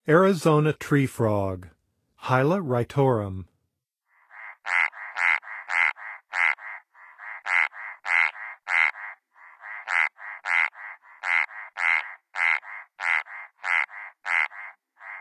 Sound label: arizona treefrog; N. America; Songs of Frogs & Toads of North America